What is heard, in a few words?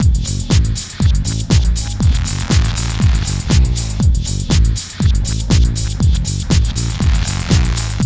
GHET ROL